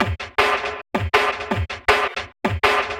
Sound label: Complex Beat, Drum N Bass; Sonic Foundry (Sony Creative Software); Knutrix Drum & Bass